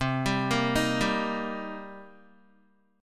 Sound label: C Minor 7th C Guitar; Cm7 arpeggiated; 7,10,10,8,7,x